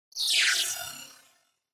Cyber

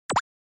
Button Click Dry; Onoma

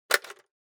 Camera Instant Open

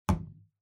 Liquid Glue Put Down

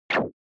Shooter, Shoot; SNES

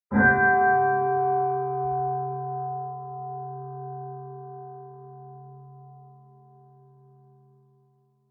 Buddhist Temple Bell

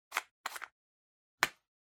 Liquid Glue Open

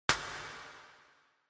Party Popper Reverb

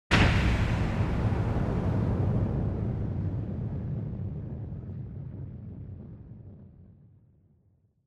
Impact Long; Onoma

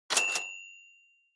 Cash Register Drawer High

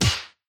Hit

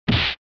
SNES Fighting Hit

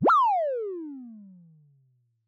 Motion Pop

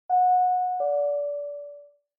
Doorbell Ding Dong

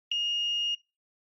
Ticket Gate Beep Tone2

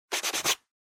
Eraser Rub

